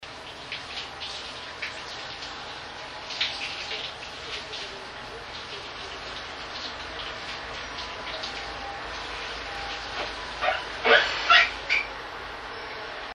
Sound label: bath siren